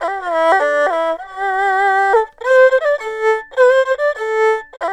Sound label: ERHU; INIS; Opium